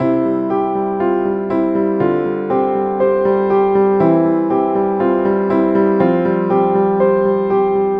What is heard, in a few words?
Simple Piano Loop